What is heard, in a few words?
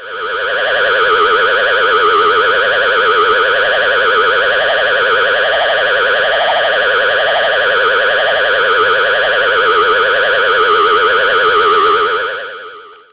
ghost